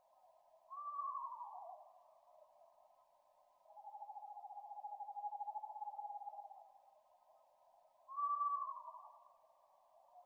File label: eastern screech owl